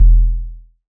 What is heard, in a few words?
electronic kick short; soundscrate